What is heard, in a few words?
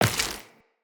Step Flesh, Footsteps Walk; Tenno